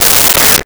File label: Walkie Talkie Static; Communications